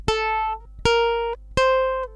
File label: staccato up, ukulele